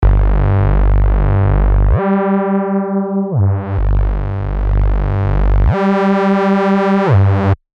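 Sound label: Bass Reese Vintage; SERUM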